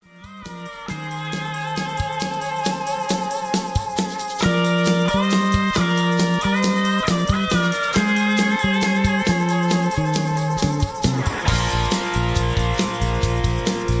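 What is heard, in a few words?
Karaoke Backing Track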